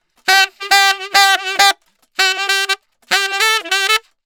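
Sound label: 100 Acid Jazz sax A major; Brass; Digidesign; Pro Tools; Pro Tools Essential Loops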